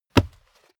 step barefoot wood plank single trample; schritte; Footsteps

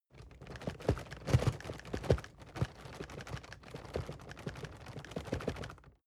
cardboard box medium shake soft long; objekte

